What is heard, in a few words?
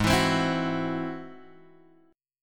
G sharp Augmented 7th G sharp; G#+7 Chord {4 X 2 5 5 2} strummed